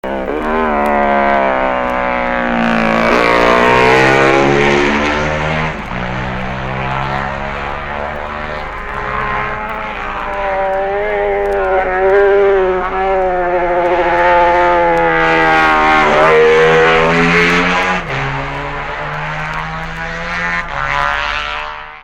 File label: 1960 MV Morrini